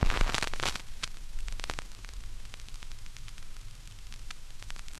smirk light wind a; vinyl

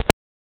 very short one shot sound, communications

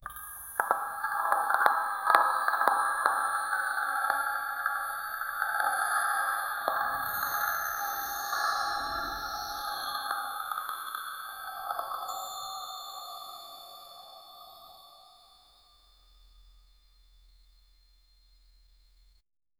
the ambient sounds of hum, disturbance

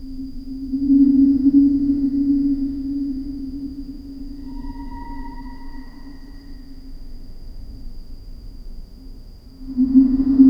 cinematic ambience of an, warehouse

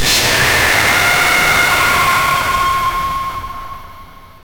scary jumpscare

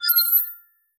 create ui and menu sound, eerie

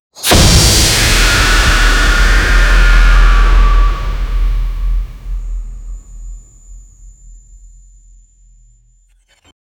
weapon

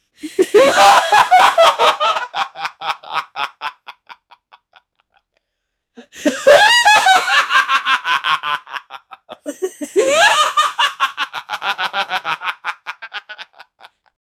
uncanny high laughter of, creepy

